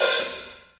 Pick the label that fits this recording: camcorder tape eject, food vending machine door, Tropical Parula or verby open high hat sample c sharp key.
verby open high hat sample c sharp key